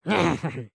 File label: Walk; Lego Strombie; build mode